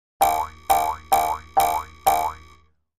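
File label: Boings With Twang Boings; boings / twangs / snaps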